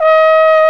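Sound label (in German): POSAUNE VIBRATO; Best Service; Alpine Volksmusik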